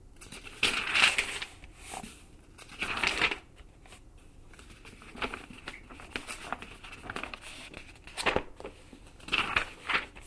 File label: magazine flip pages